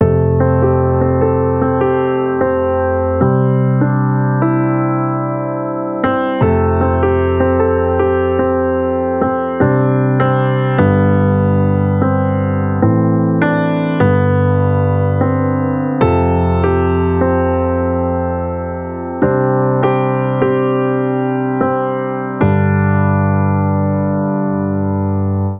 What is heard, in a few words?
Pop Melody Cold Summer C Maj A Min 150 BPM, piano; nikos piano sample pack